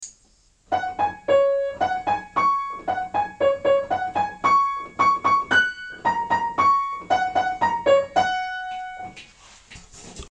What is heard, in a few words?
Stepping Stones, Piano